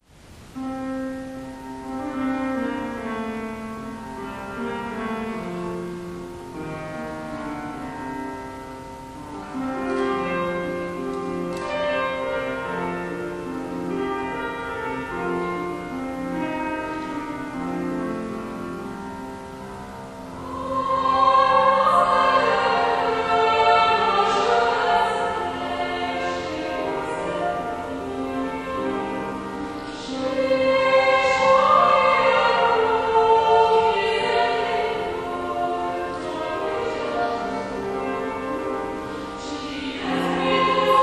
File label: female Choir